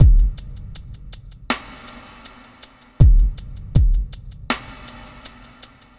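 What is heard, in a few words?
Grungy Beat; ambient vol 2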